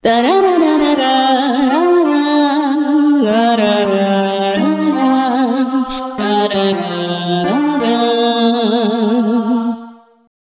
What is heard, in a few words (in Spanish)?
COROS; multitracks